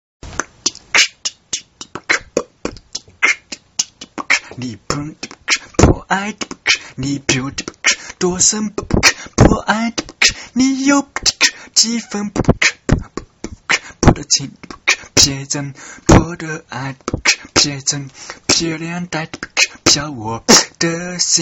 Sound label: beatboxing